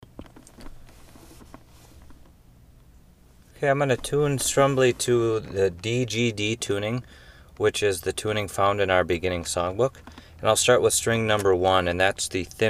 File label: strum tune